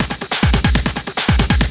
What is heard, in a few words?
TECHNO BEATS 140